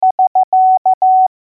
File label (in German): verstanden, morse alphabet